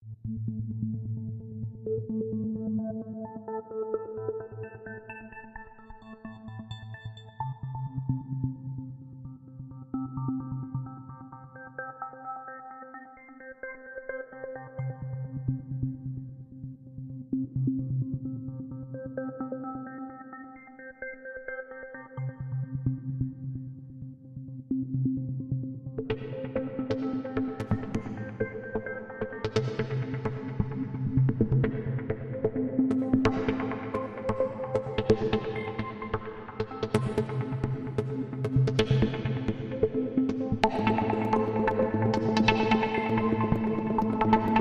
Drone, Light

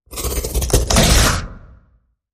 Zombie Suck

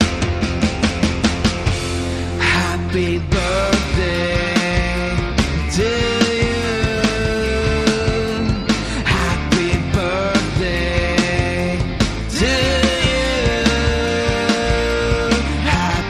Male Rock Vocal Happy Birthday

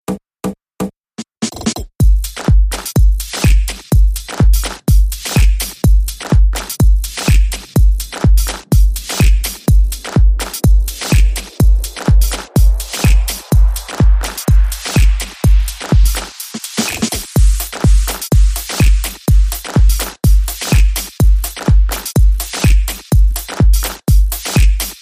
Minimal Vox Beat

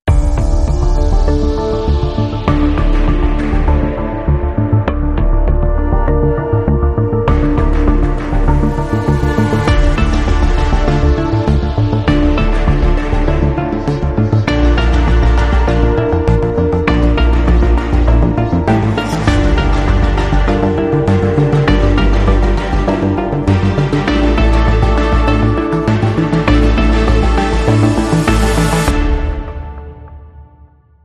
Emergency Landing, No Drums

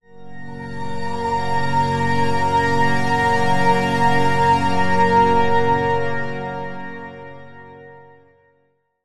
Link Sting

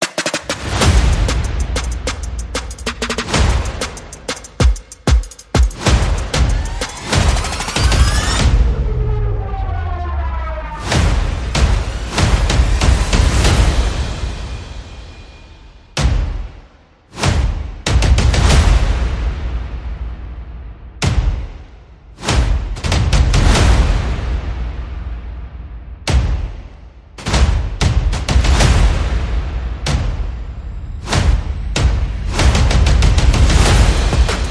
Percussion and Effects

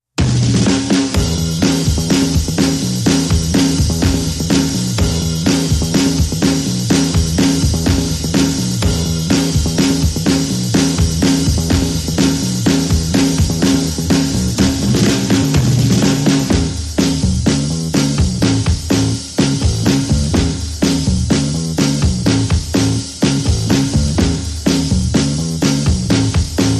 Drums and Bass Mix

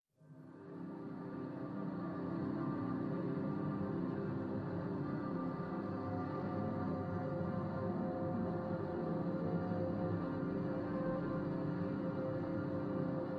Drones Only

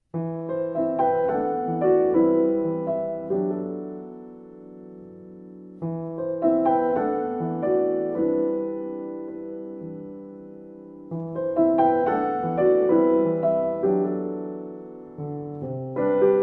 Remembrance Solo Piano

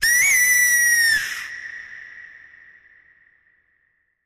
Phoenix Bird Cry

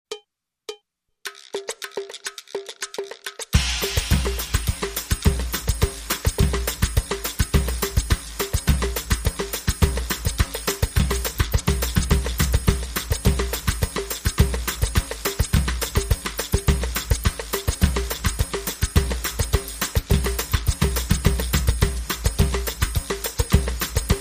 Samba Loop

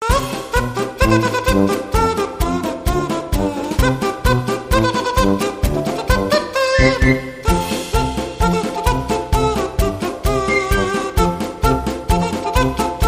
Rubberkazoo Band

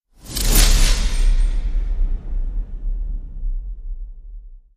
Hit Industrial Axe

